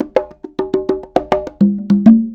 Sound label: bongos; loops; sampleswap